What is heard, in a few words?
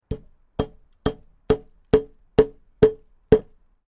hammer sound effect